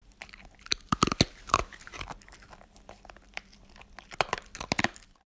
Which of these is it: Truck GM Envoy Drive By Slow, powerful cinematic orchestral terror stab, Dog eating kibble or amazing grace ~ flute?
Dog eating kibble